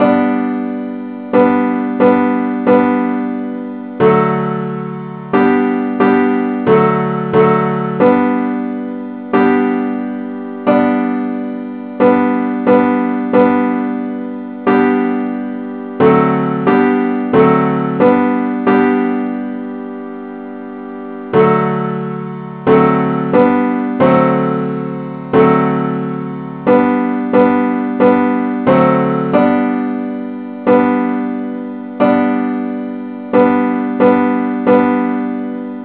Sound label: henley simple acc key g